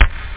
Agony Bass Drum

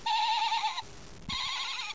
baa; supreme ruler 2010

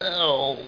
Orrrrg; QulTime4

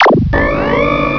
Mino Lock Modern; Loony Labyrinth 1.02 Demo

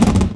antilag ext; Rally Xtreme; cars

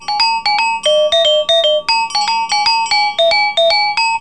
kantil sound, GAMRAN; Epson

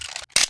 MP5; The Sum of All Fears

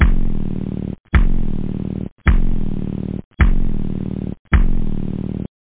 boeze, drums techno; OctaMED SoundStudio V1

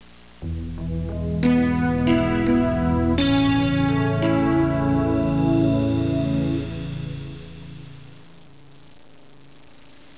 synth

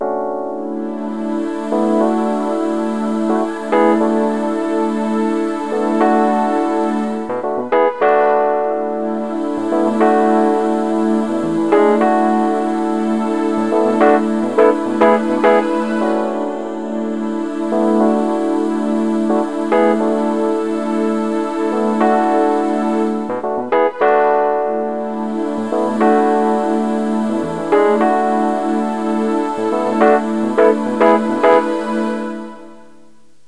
Synt; SYNTH; 105 BPM

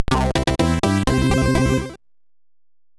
Jingles, SIDTunes

